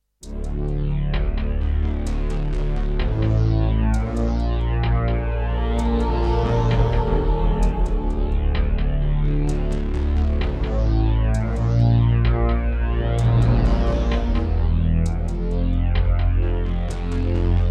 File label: Roland PMA-5; Descent II